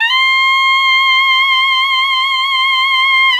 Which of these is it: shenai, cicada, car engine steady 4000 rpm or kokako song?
shenai